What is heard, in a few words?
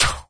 Swing; MapleShrine; mkxp; gameasync